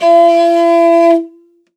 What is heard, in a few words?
L, morin khuur, World Instruments; Best Service ProSamples vol.52 - World Instruments 2; AKAI